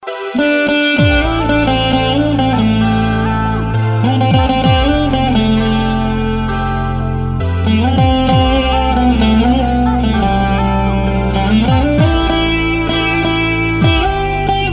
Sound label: beat